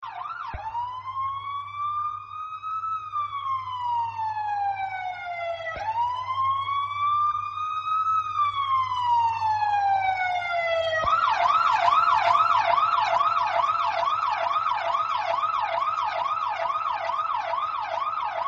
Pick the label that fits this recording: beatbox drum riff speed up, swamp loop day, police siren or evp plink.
police siren